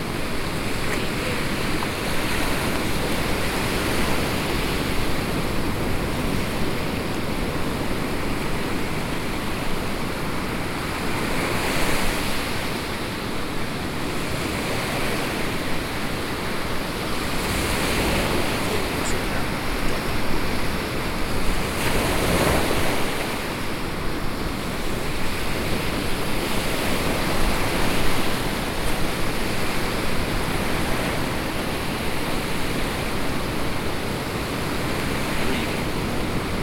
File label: Rain on a hood near the sea loop